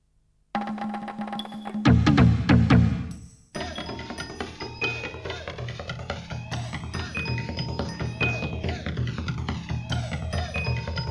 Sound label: yamaha sy85